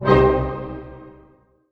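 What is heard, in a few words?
C minor pianissimo, orchestra hits; Sound On Sound - Peter Siedleczek's Orchestra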